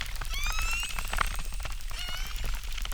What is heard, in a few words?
Chirp, acquarium